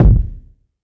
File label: huge kick drum single shot g key